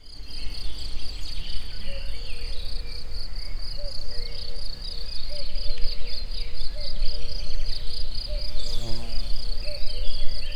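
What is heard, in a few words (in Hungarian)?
kakukkos egerturistaház, rovarok; termeszetben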